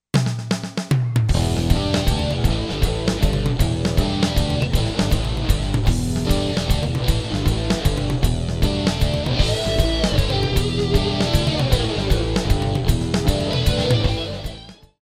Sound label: rock